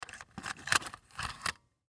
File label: Camcorder Place Tape In Deck Insert, Sony Mini Dv; Technology; Camcorders; Blastwave